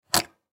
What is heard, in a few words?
Guitar Plug, Guitar Plug 1/4 In Instrument Jack Out Amplifier; Musical; Blastwave